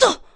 Crash

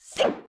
Link Roll; Link rolling; The Wind Waker; Zelda

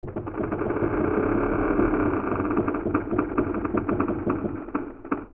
Tree Open; Deku Tree; zelda; OOT

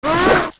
Hit; Moblin; zelda; OOT

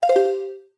Pause Menu Close; Open and close the Pause menu (Recorded)

